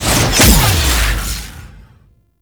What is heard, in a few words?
Projectile Throw; Warframe; Cephalon Weave